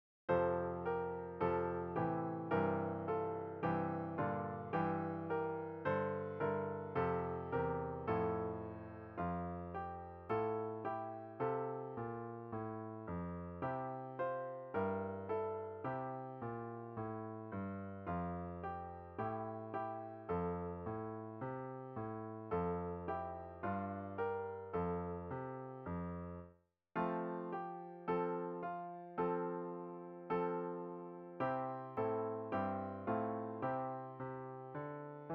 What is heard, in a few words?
Solo Two F Brass; Development Band Tuition